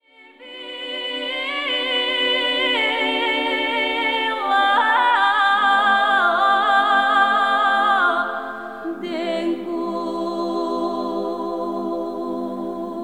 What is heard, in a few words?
female choir, bulgares